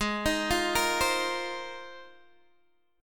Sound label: G sharp 6th Add 9th G sharp Guitar Open G